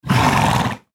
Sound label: horse shouting